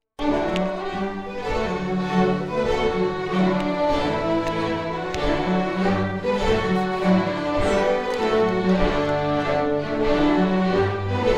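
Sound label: Strathspey; Capt Simon Fraser Collection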